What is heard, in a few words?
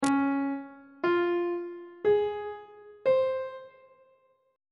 d flat ionian 7th chord 1 is d flat major 7th on treble clef